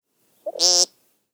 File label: American Woodcock Peent